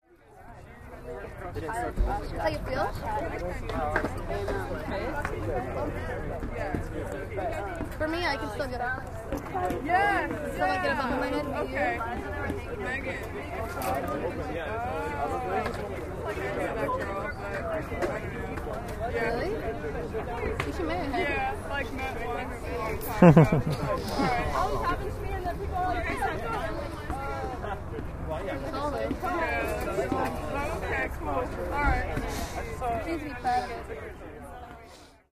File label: chatter